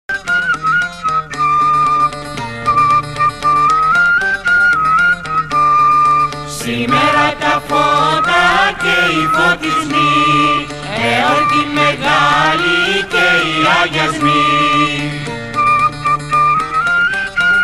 Κάλαντα Φώτων Φούρνων Ικαρίας

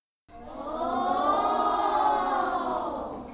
οοοοοο

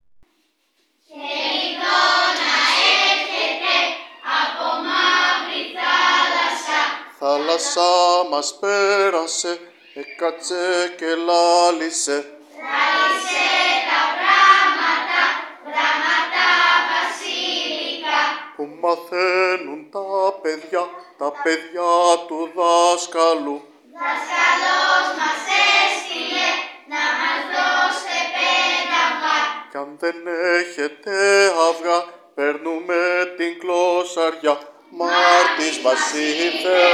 ΧΕΛΙΔΟΝΙΣΜΑΤΑ

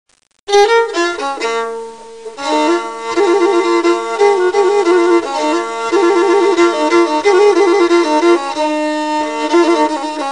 Ποντιακή Λύρα

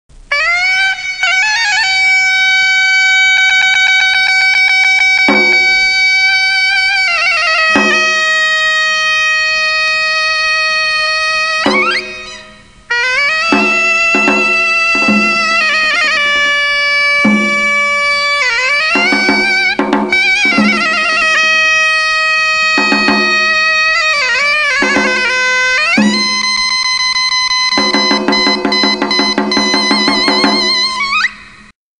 Ζουρνάς

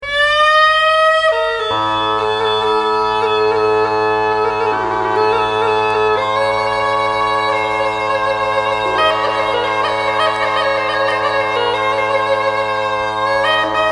gkainta

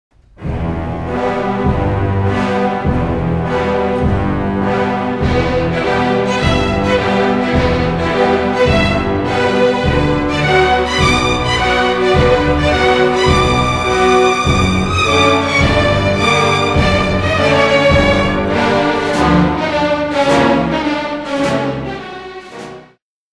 Εγχορδα με δοξάρι συμφωνικής ορχήστρας Allegro Pesante; Ο χορός των κυριών

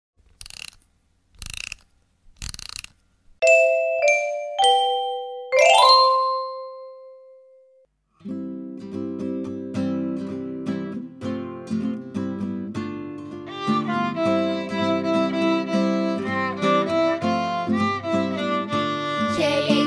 Χελιδονίσματα Τα κάλαντα της Άνοιξης

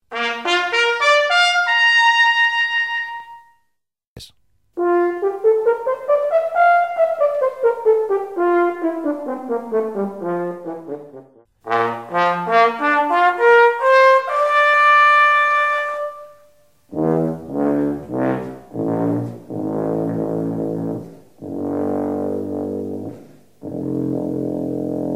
Αποσπάσματα απο μουσικά όργανα

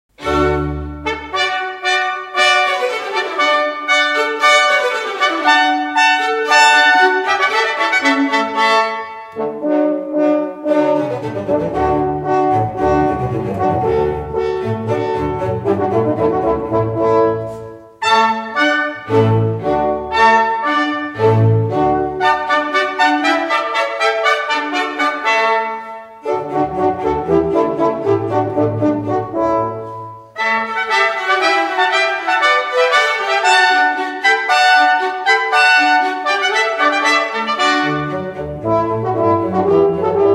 Οικογένεια χάλκινων πνευστών

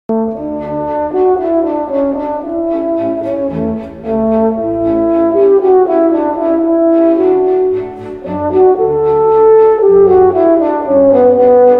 Κόρνο Allegro Κονσέρτο για κόρνο και ορχήστρα σε ρε μείζονα; 1ο μέρος

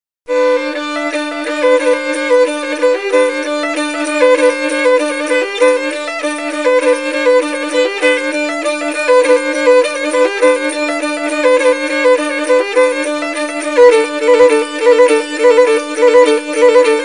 lyra; german austrian dances

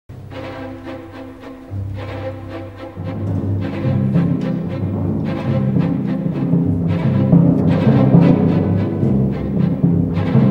Συμφωνικά τύμπανα; Η οικογένεια των κρουστών

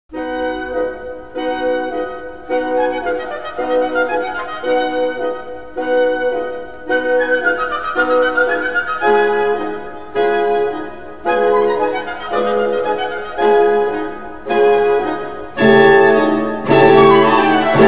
Xylina Pneysta symfonikhς orchhstraς; Kerameikoς

